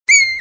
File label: cat